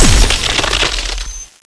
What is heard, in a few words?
frost hit; Counter-Strike 1.6 HighSkill; cstrike; zp